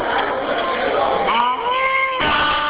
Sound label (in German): Stier; steirischerstier